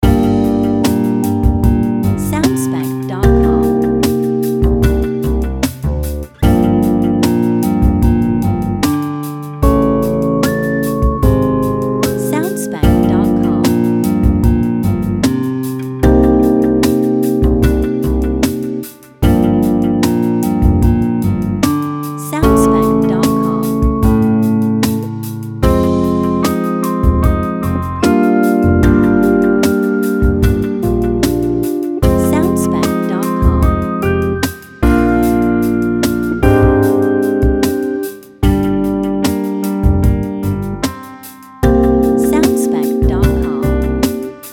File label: City By Night Main Loop